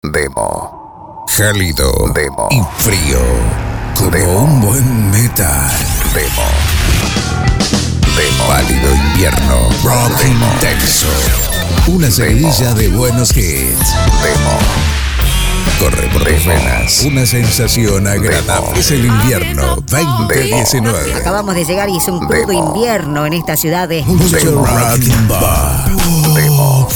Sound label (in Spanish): arte invierno rock